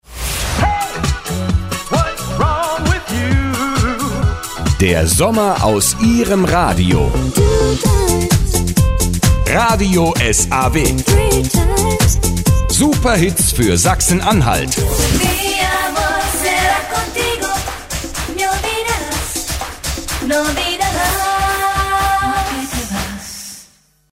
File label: saw hook